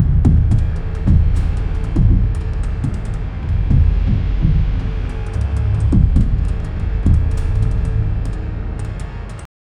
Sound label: atmospheric pirate battle